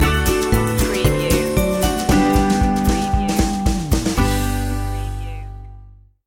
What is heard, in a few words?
greet the day stinger